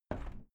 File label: window plastic squeak open regular